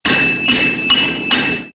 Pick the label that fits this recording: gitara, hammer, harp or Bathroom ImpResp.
hammer